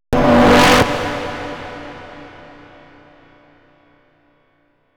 Optical Blip; AMG - Prototype Drum & Bass

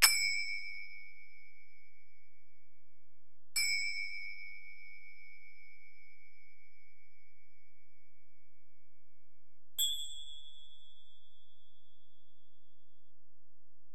Ac Perc, Drum Machines Alesis DM-PRO

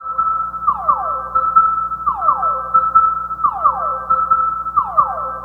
Sonar FX; Sonic Foundry (Sony Creative Software) - Stylus Pressure Urban Grooves on Digital Wax; Matrix Z

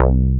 BASS, SYN BS; AKAI S6000 CD-ROM - Volume 1; SAMPLE WAVE MIXING 2; SYN BS #3

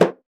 Dof Sak, Roland BK-5 Percussion

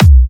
Kicks B; markus hakala

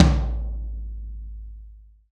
TOM TOM, Real

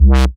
BASS Fwapp, bass; M8; Electronisounds

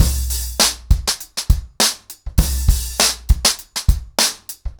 100BPM, ACOUSTIC DRUMS

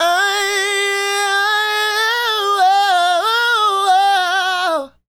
Male Solo, Hip-Hop and RnB Vocals

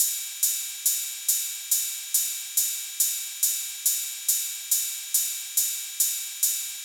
drum Loop 140BPM Ride; Vengeance - Essential Clubsounds Vol.5; VEC5 Drumloops; VEC5 Multi Loops 140BPM

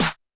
TD-20 Tom A, Roland TD-20 Toms

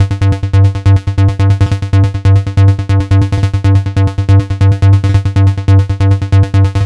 NRG Bass, Trance; Basses; Sonic Foundry (Sony Creative Software); Trance NRG VOL-1

